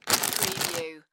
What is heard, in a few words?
Potato Chips Bag Open